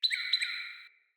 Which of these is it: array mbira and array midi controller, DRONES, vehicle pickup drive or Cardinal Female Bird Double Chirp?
Cardinal Female Bird Double Chirp